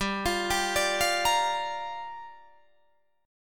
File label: G Minor 7th, Guitar, Drop D